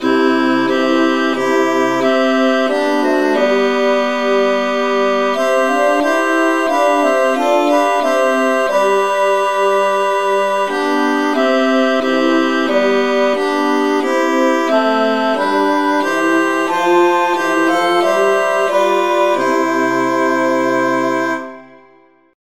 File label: traditional key c; SAINTTHOMAS